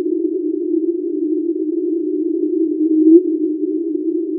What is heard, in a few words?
chirp; pycbc